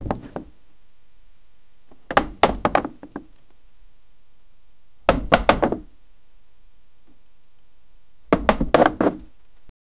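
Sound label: broke wooden box, breakage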